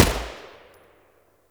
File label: Shot; Warframe; Eidolon; EidolonJetpackMarine